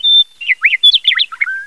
Meadow Lark; Mountain Quest™

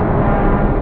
orch; OctaMED SoundStudio V1; synth chords

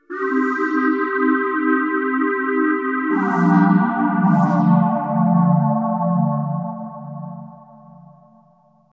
synth pad ambient 160 C; Sphere; eJay

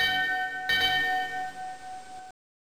voicehole bpm130, drumloops

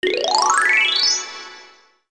success

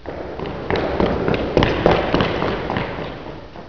steps

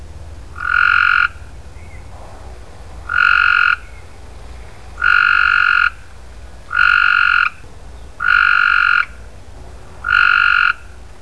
East Texas flood frogs; BMUG

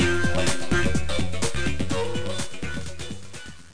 Music Loop; 300 games for mac; Arcade; MegaPong

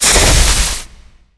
psionic vuln; Earth and Beyond; EA Games

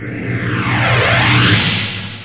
fly; warrior

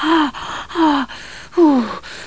hahahu; Virtual Casino: Secret Fantasies

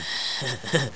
eghhhhuuhuuhhu; World of Amiga; butthead

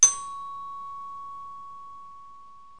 chime; Multimedia Cube; bells

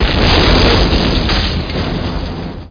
large vehicle destruct; SPECOPS

